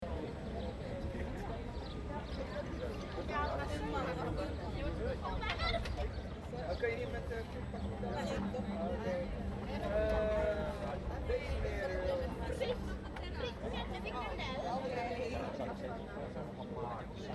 place du complexe commercial; block one

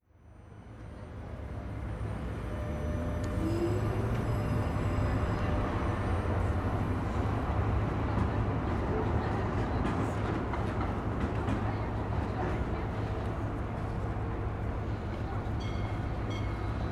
ferroviaire boucles artificielles

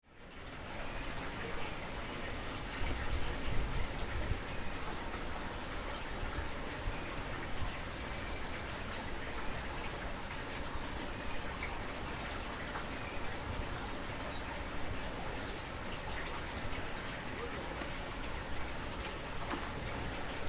nefta point eau; resonances oasiennes

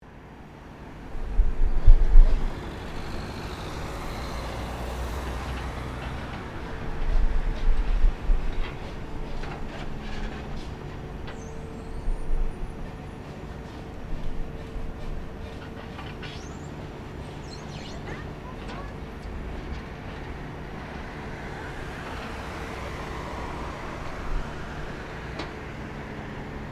nappe surplombante

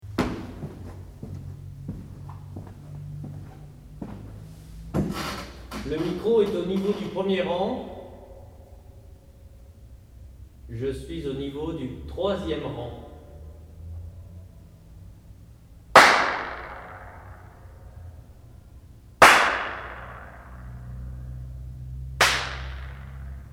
echo flottant campus; JPO campus

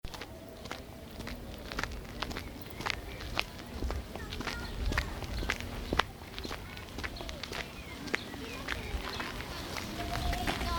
sol urbain gresillement

